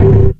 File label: glass pane knock